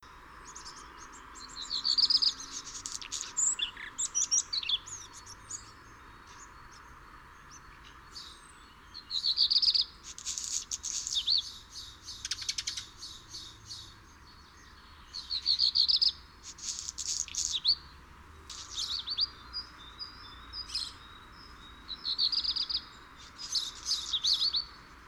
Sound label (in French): rougequeue noir